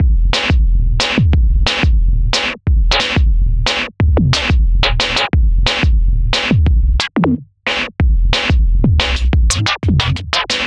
gulp 090bpm; Zero-G; Downbeat Dance Grooves

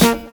KLAXON BE; Univers Sons - Kitch 70s

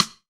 Side Stick; Roland BK-5

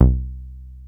303 C2, TB 303, Synthesizer; Hollywood Edge; Giorgio Moroder Rare Synthesizer Collection

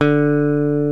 LES PAUL

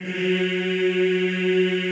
EEH F#2, Extended Classical Choir; Best Service

